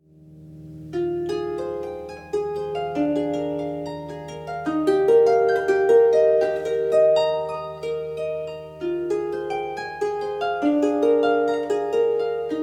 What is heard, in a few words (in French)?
Nocturne pour harpe; Nocturne pour harpe excerpt